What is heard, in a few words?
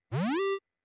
Damage Alert